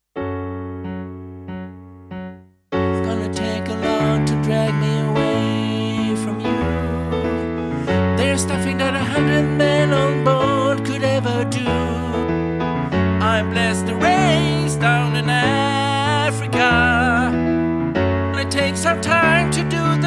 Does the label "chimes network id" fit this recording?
No